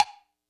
kit, Yamaha Motif-ES8

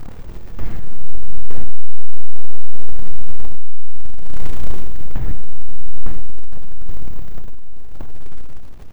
6 HZ, Disjointed Textures; USB Soundscan vol.33; AKAI; CHAOS 1

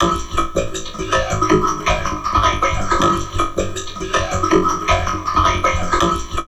VOCOD, Drum Loops Crazy Processed

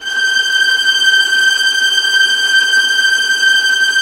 VIOLINT A, Synclavier Strings; ILIO

